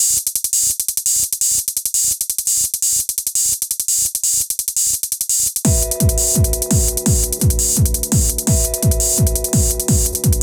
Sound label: Rumble